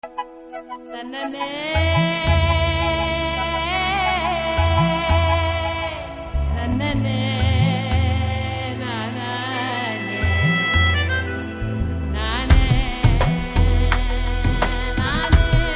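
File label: background music Humming Ringtone; Karnan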